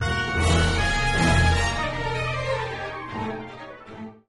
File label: music mission complete accent; star-wars-rogue-one-boots-on-the-ground